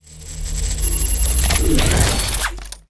cannon misfire

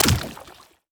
lantern soul

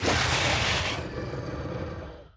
sweep tail voice

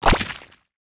mushroom snail

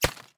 debris

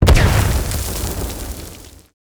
gold explo buff normal

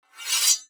draw sword

